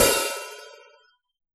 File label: hip hop open hat